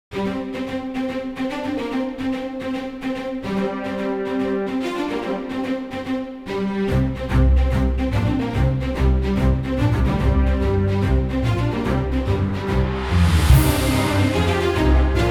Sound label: pirate Action; Hyper Knight Battles